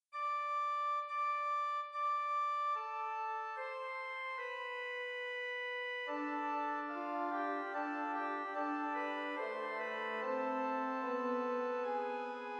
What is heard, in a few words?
Fuga Plagale Wet, organ; Suonata Prima